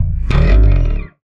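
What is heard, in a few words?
slap, bass; Xsample VOL-1; SLAP+THUMB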